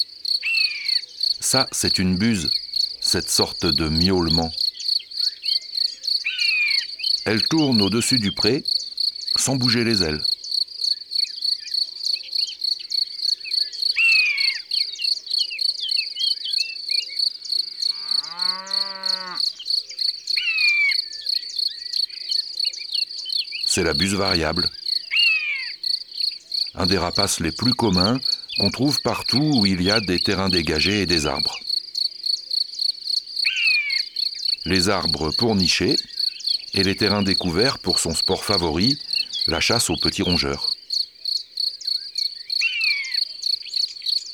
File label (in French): Buse variable; ABC de la nature